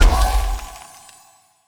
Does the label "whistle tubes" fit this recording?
No